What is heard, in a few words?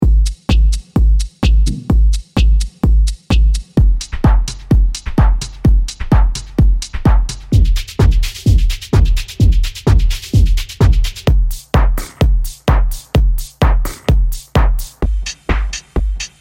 dark melodic techno